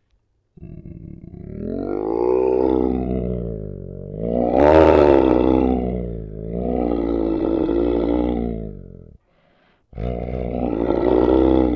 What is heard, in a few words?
Demon Growling